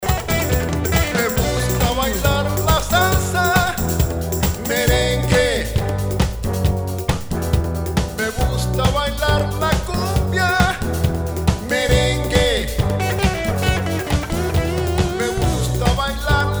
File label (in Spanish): Sabroso Merengue